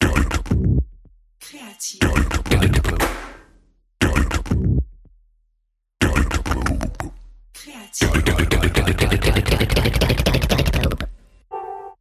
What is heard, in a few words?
Exotic Mouth effets voix